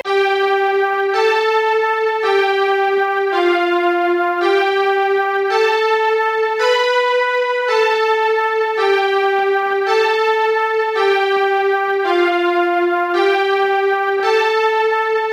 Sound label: strings